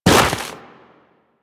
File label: Run Deep Snow, Footsteps; Warframe; Operator